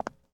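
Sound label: Foot Concrete Shoes Walk; Lucius III; pc linux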